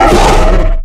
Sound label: giant idle; mutants; STALKER; customnpcs